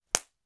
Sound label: item bald head pickup